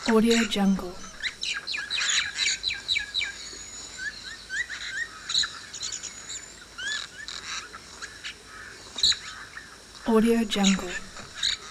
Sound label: birds squeaky bird ambience some shrill birds; جیک جیک پرندگان